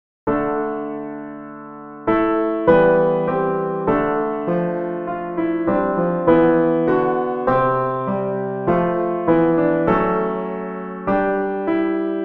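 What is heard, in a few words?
Machaut Kyrie piano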